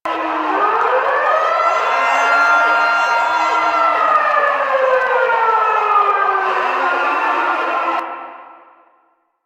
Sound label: Alarm Trigger Loop; Scaldra; Warframe; NineteenNinetyNine; Stage3RideOrDie